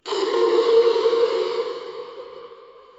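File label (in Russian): рык вампира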